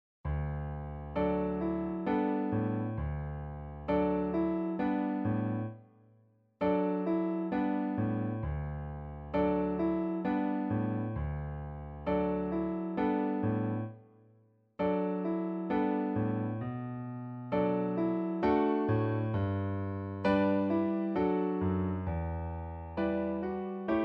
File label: piano; 朧月夜